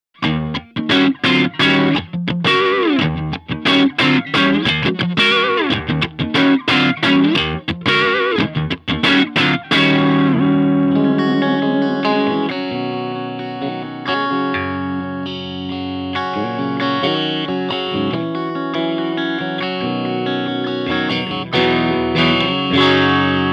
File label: RETRO KING 18 WATT COMBO